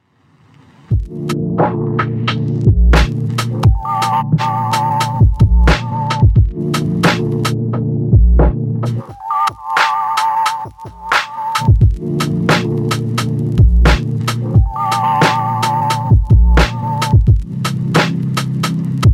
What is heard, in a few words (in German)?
Natural Swing Tief